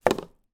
household swimming pool filter net down on wooden decking